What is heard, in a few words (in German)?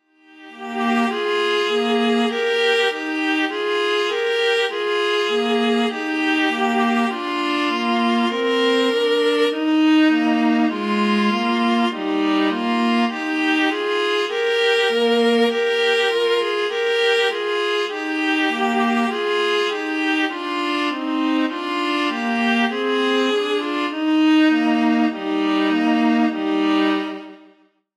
Andante Es Dur